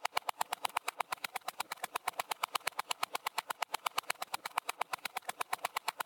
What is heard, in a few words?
Wheel Loop